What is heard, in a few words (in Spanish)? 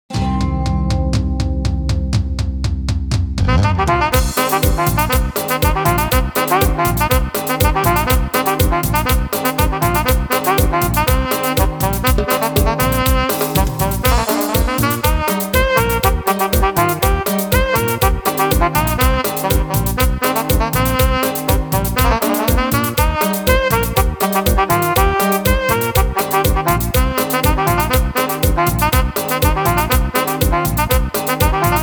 Cumbia